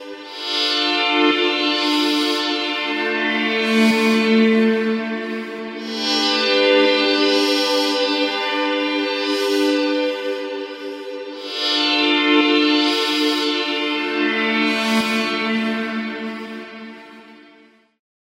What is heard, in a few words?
pad; anagram